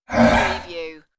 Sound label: Male Groan